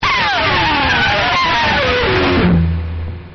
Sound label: scratch